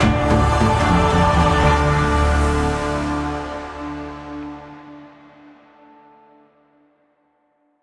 combat stings win; Griftlands

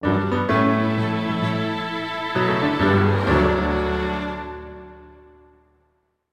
(Jingle) Victory; Gnasty Gnorc's Cave

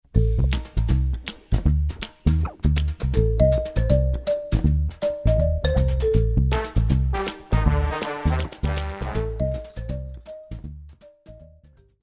Combat; MicroVolts Recharged

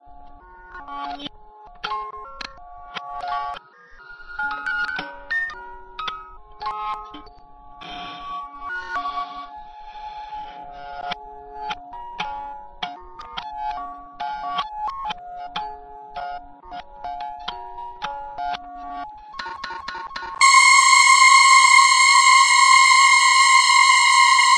Clock Glitched; Final Nights 3 Nightmares Awaken; My Grandfather's Clock

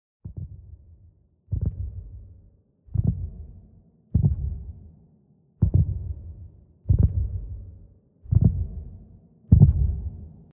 Heartbeat; Hornycraft

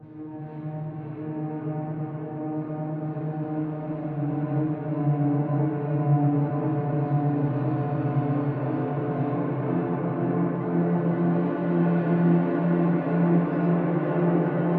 DRONE; escape academy; The Breakout

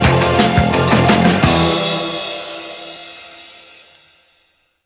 Epic Confrontation Ending; bully scholarship edition

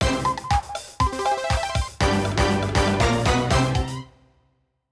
Jingle 1-Up; WarioWare: Smooth Moves

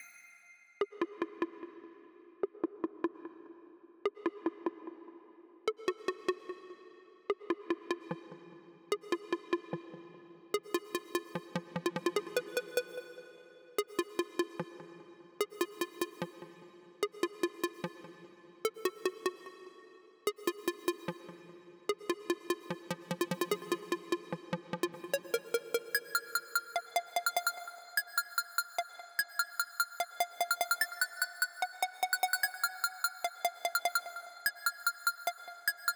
Breakout Frantic Mix Stem Layer; Breakout Recharged

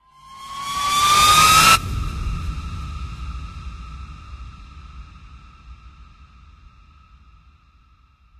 Swell; friday the 13th killer puzzle

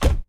Stamp

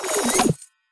Btl Actlrng Boxclose; The Denpa Men; Android

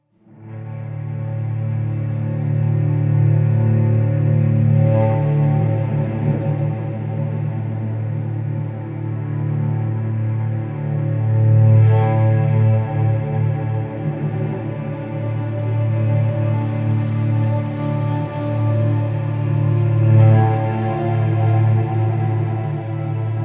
Forest Intro Music; bramble the mountain king; ps4 ps5 switch windows xbox one xbox series xs

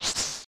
Swoosh

